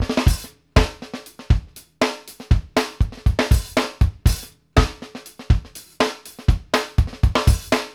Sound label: SYNC FUNK, drum; Sampleheads; New York City Drumworks VOL-1; KD JBEAT 120